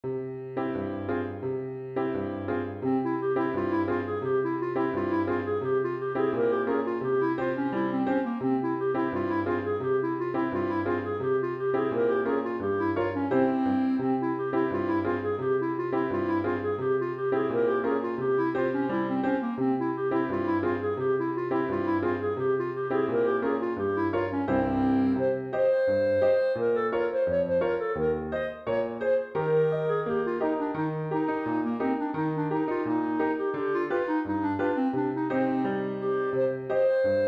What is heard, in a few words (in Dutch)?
Little Galitsian Dance met klarinet